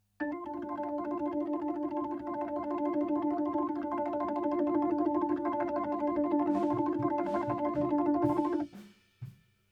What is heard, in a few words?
HAMMOND